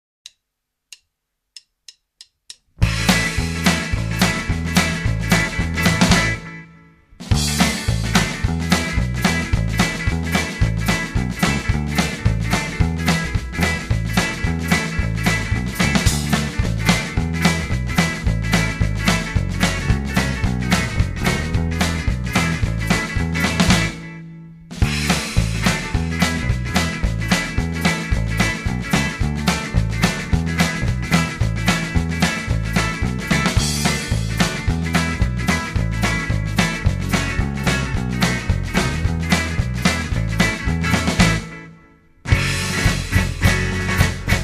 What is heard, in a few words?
backing